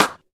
Claps & Snares

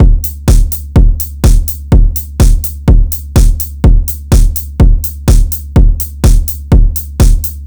125 Digital Breaks; Loopmasters; Jon Carter & Alex Blanco; Block Party Essentials

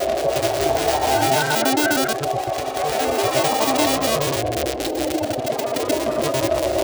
Bpm140 Arp Loop Am, Dubstep; Dukha

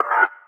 D STAB, Funky Underground

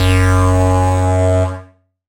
Bass Shot F#

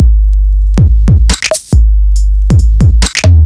Bpm139 Break, dubstep drum loops; Cron

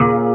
Bb STAB; Loopmasters; Funky Underground Vol 2

